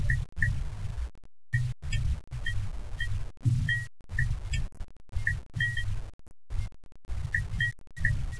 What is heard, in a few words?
light loop; svencoop; arctic incident; ambience